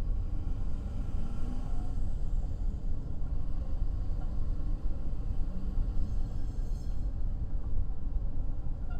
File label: Strong Wind